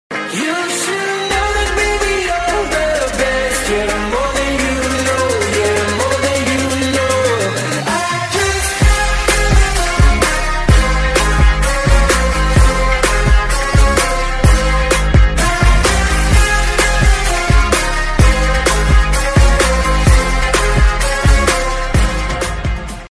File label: ringtone